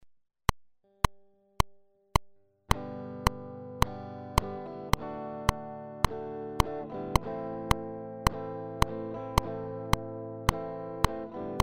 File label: Chord C, guitar; Chord Pro; guitar beginners